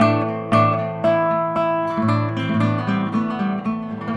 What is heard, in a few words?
Bass, Promotional Loops; Sonic Foundry (Sony Creative Software); Not Just Another Pretty Bass